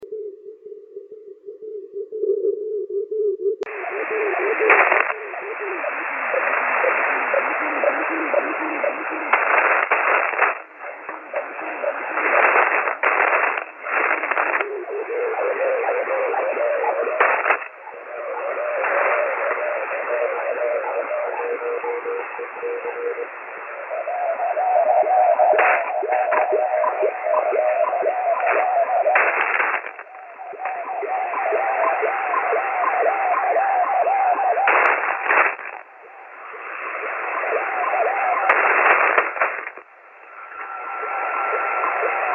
weird 1820 noise

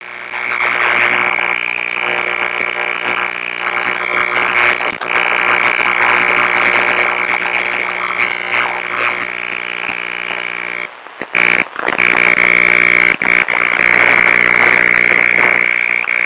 noise 15m; NNW

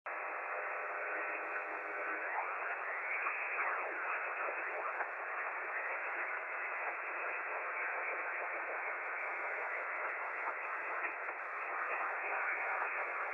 160 noise shutdown SSB

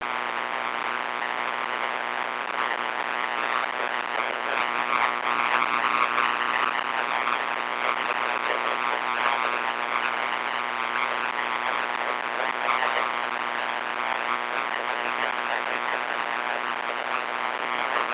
noise 15m

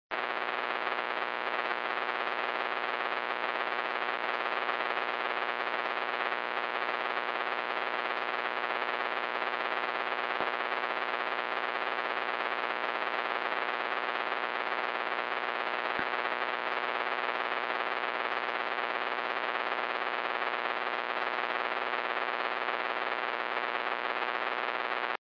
6m noise

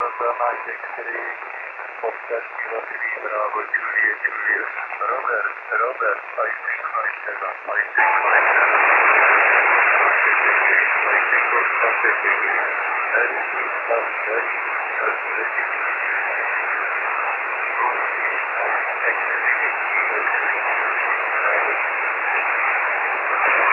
80m RFI

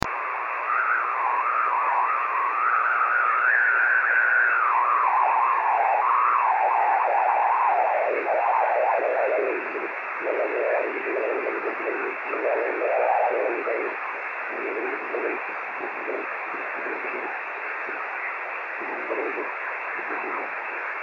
switcher QRM

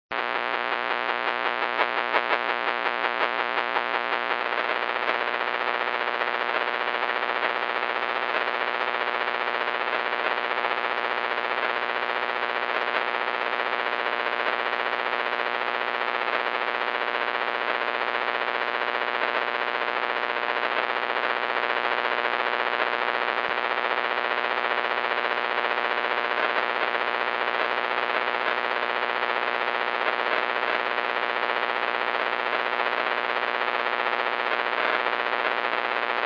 6m noise

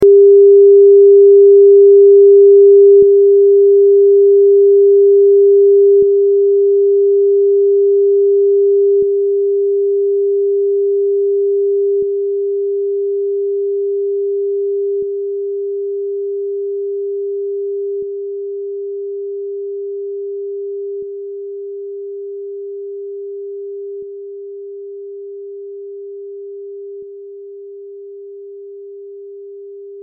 3dB steps

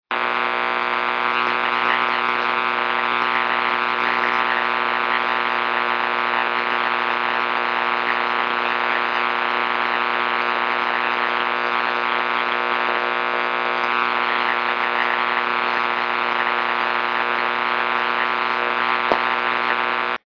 2m noise

